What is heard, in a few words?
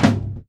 Closed Hats